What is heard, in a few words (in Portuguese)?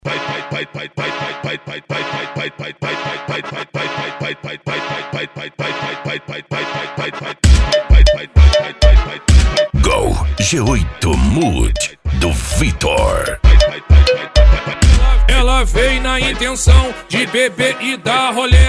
funk ritmado